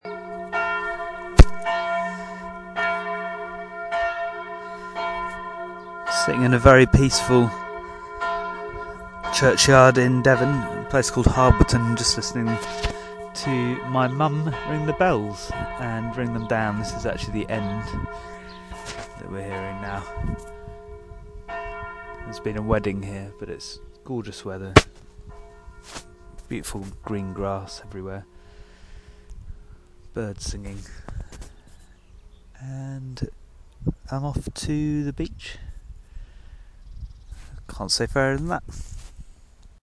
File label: wedding bells in devon